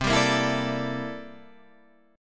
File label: C 13th C down Guitar Standard; C13 strummed